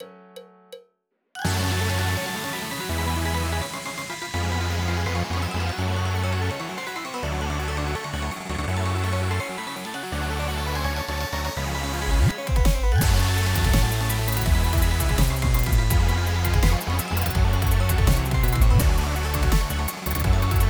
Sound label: inst.